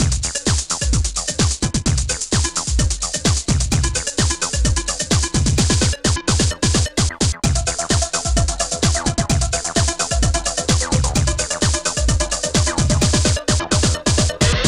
amiga techno

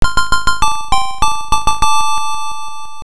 son son main background music Intro; arcade

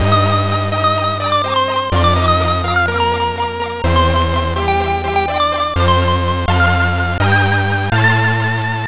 game start, amiga; bubble bobble

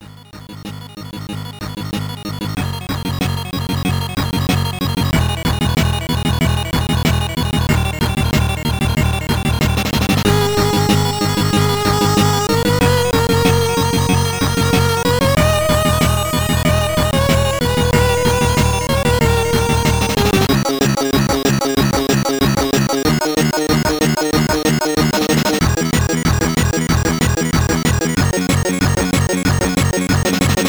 amiga loader; turrican

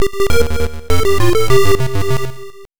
arcade round start; arkanoid

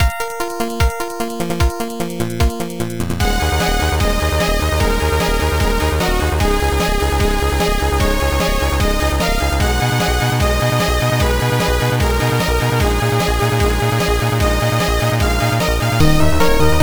amiga in game; turrican iii

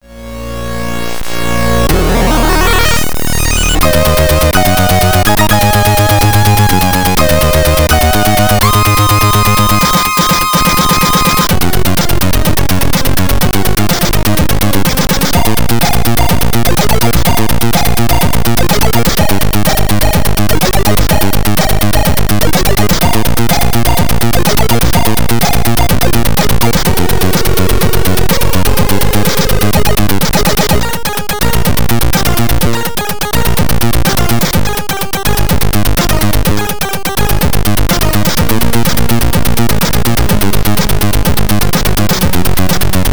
c64 in game; r type

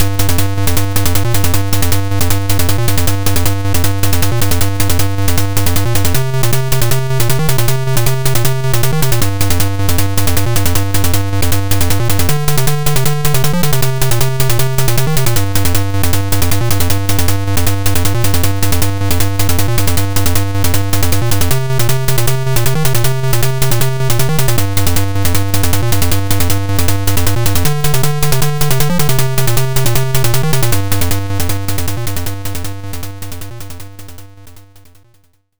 arcade in game background music; kung fu master

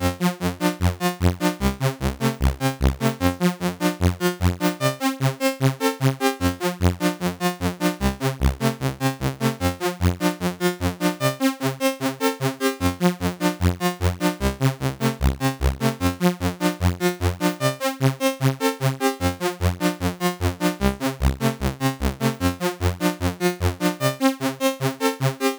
c64 in game; lazy jones

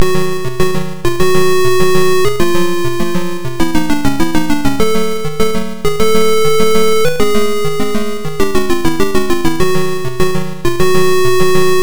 background music; arcade; 1942

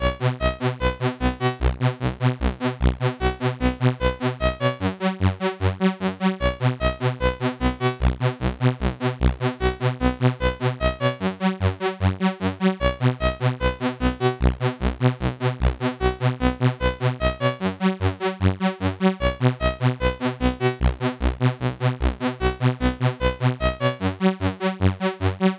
c64 in game; lazy jones

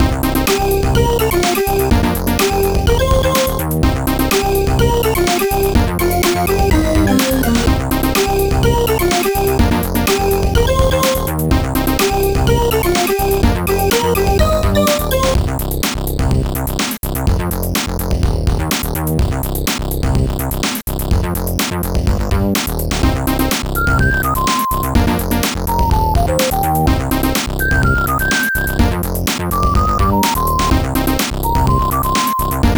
amiga in game; simulcra

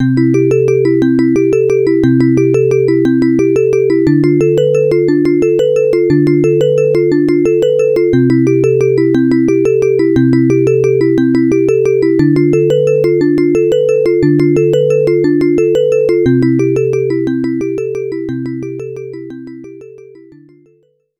arcade special bonus; bomb jack

